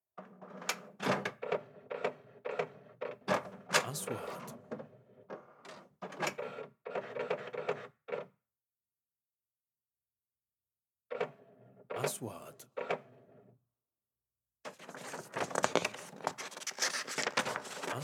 طابعة ، آلة طباعة وتصوير

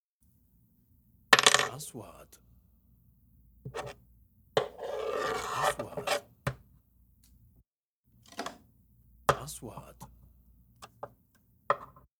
مسامير; sound effect

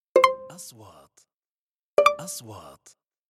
نغمة نقره الكترونية, transitions

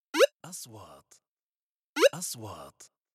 نغمة نقره هوائية; transitions

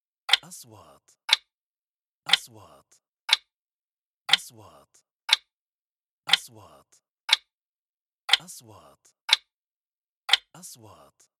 عداد زمني; transitions

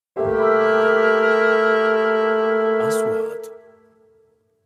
إنتقالة كوميدية

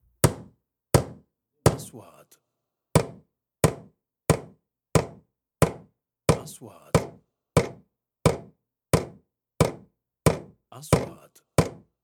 مطرقة; sound effect

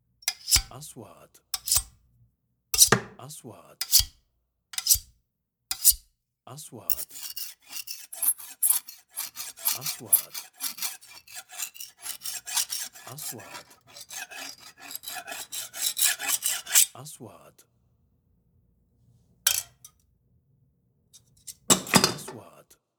منشار حديد; sound effect

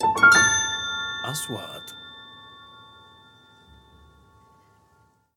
إنتقالة تنبيهية; sound effect; transitions

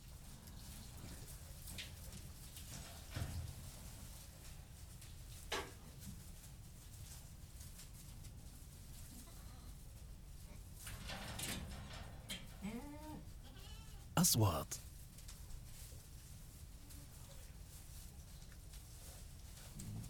أجواء حظيرة غنم; sound effect; رمال

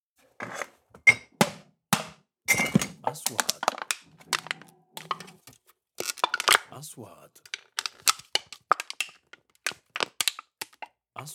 صوت خبيط ادوات المطبخ زجاج معادن خشب; sound effect; kitchen

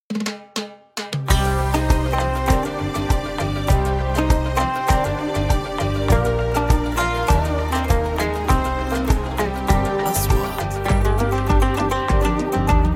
موسيقى تصويرية سياحية; travel

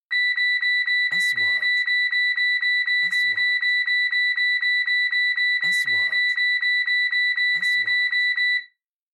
صفارة إنذار; transitions

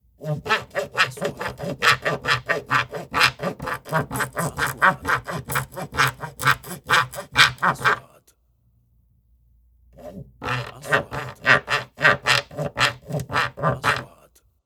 منشار; sound effect

